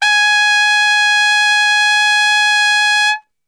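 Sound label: G#2 trumpet straight, brass; Best Service; Brass Super Section; TRP STRGHT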